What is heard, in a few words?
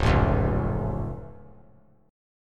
Db Augmented, down; strummed; JGuitar